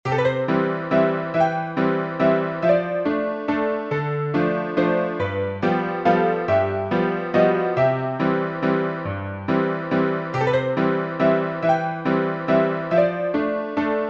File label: PIANO